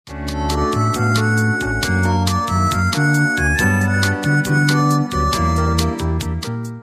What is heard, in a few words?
bossa nova